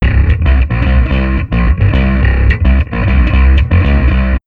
bass; Zomboid; TrueMusic; Tsarsound

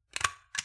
nail gun rack; Zomboid; The Workshop

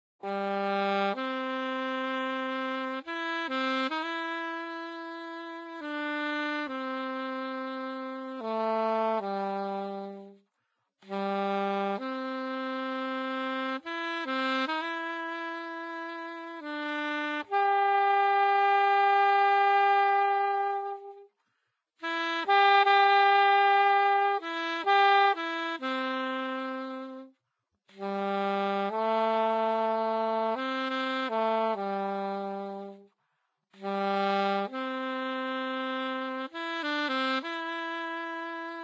Saxophone; Zomboid; Lifestyle

